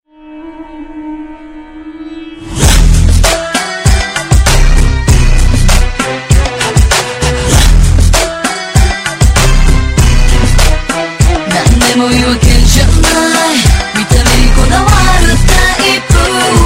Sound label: arabik romantik